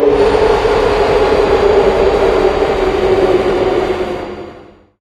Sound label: elevator arrive